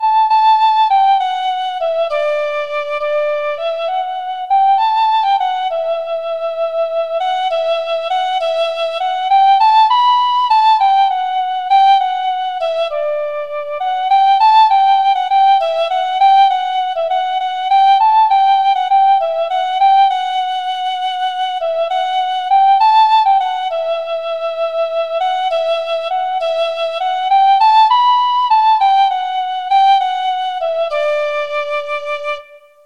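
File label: penny whistle, tin whistle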